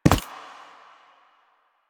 Step Default, Foley, Footsteps; Enemies; Soldiers; Warframe; Lasrian; Butcher

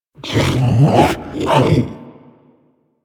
Predator Vocal Captured Idle; Warframe; Hunting Critters

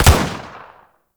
Shield Lancer Pistol Shot 3D A; Warframe; Lasrian; Lasrian Soldiers; Weapons

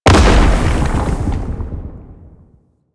Octopede Horrors Rock Fall; Warframe; Man In The Wall

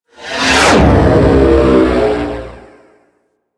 Ship Close Short Fly By, Engines; Warframe; Vehicles; RailJack; RailJack Enemy Ships; Grineer; Engines Generic

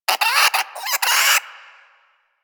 Vocal Alerted, Hunting Critters; Warframe; Cryptilex

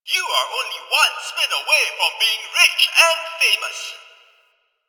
Gambling Machine Bark; Ambience; Props; Warframe; NineteenNinetyNine

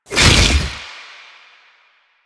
Stop, Motorcycle; Motorcycle Ninety Nine

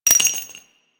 Shell, Weapons; Dual Vipers

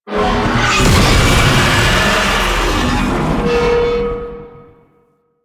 Tank Infested Vocal Ability; Enemies; Barks; Warframe; Lasrian

